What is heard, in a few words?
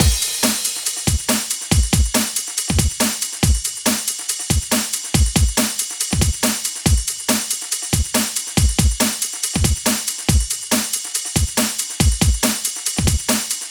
Breaks, 4-Floor Breaks Kit